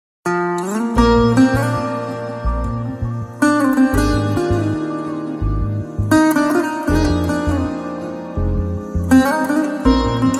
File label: Guitar BGM Ringtone